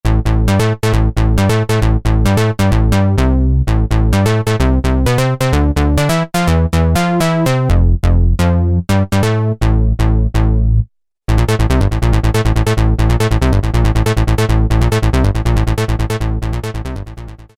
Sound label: SH101 Bass, Roland SRX-07; Roland SRX-07 Ultimate Keys